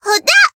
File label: Voice attack